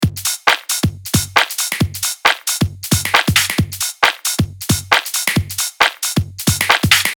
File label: 2 step beat; Beat Dissected